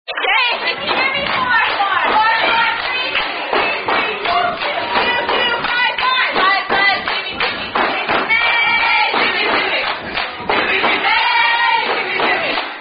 Zoomy Zoomy, Drinking Game With Song

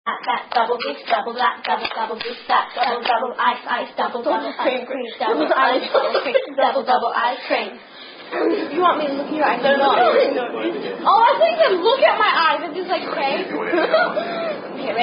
Hand Clapping Game; Double This

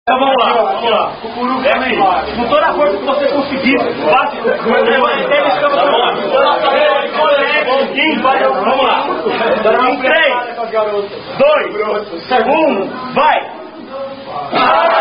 Spoon Fight

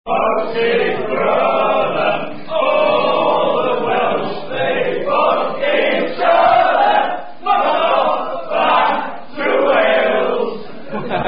Rugby Chant; Prague 091; Welsh Should Fuck Off To Wales

